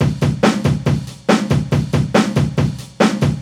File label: Breakbeat 140 BPM